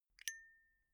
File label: zippo flicked open ringing